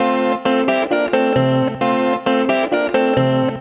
Ac 12 Str Gtr 133bpm F, Twelve String Guitar, African Guitars; Quazibeat; African Secret